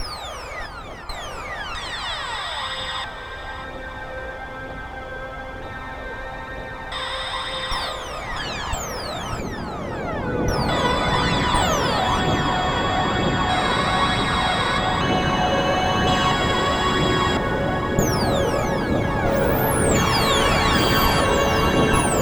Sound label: C, Synth Line; 90 s Sample CDs; NiceBeats; SynthLine VOL-13